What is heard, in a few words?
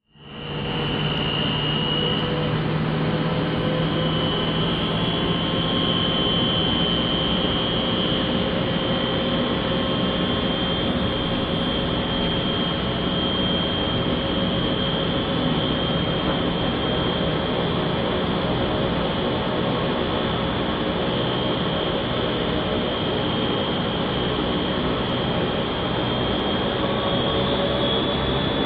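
Prop Twin Interior Take Off, twin turbo prop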